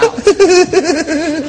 Giggle

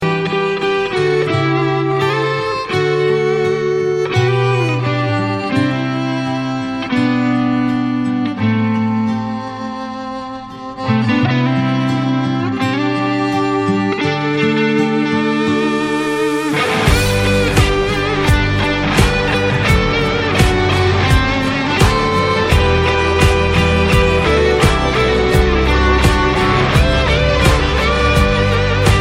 guitar

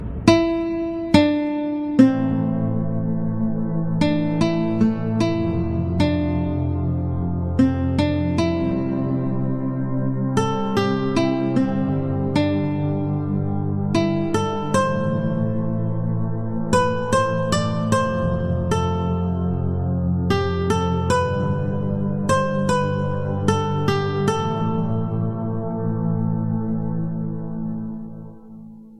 acoustic; mgs

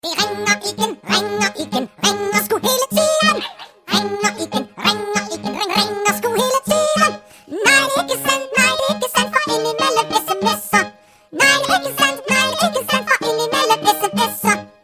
cristmes song, reggae

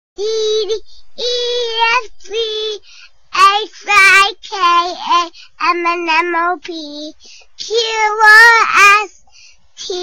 abc lol, ringtones children

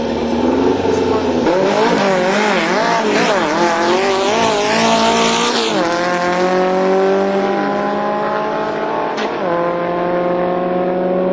drag racing

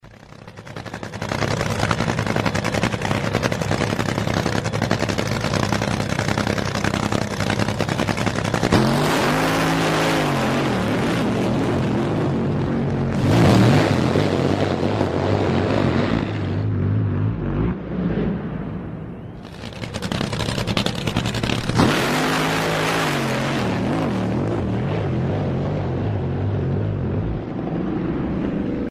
drag boats

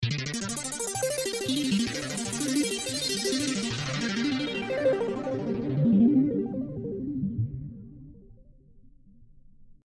Glistening Ring

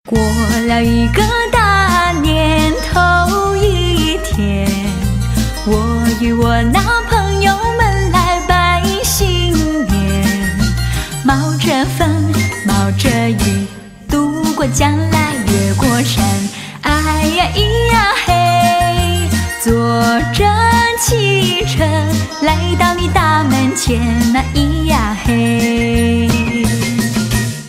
ganesh aarti

